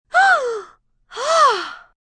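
Gasp Inhale Female, Gasp, female, inhale & exhale (human voice); Gasping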